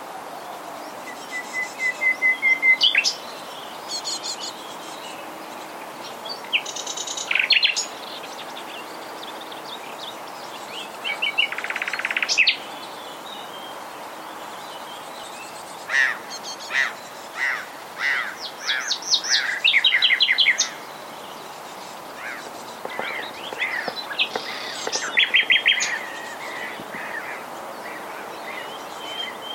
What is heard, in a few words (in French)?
rossignol philomele; lieusaint 77